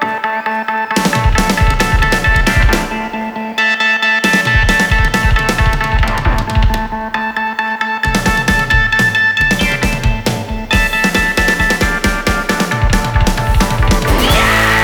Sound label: Drum Solo; Live At Eden Project